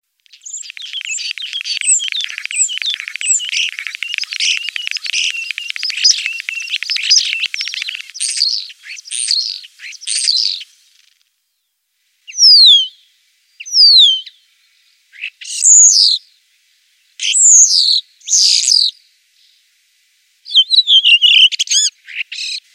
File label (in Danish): Staer, Ugens Fugle Stemmer